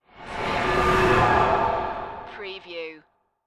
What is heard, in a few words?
Spaceship Pass By